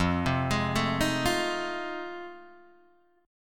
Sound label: F 7th Sharp 9th, Guitar, Open D